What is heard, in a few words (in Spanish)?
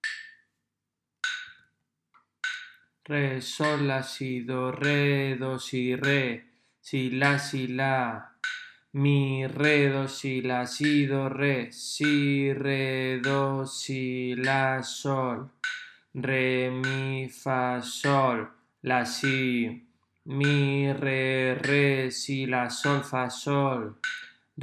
RITMO